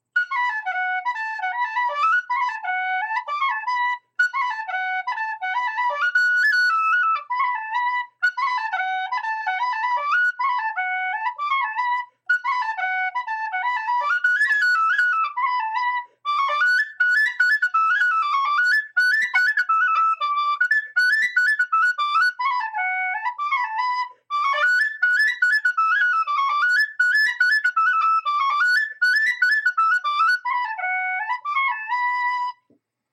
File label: Woodnote; Otters Holt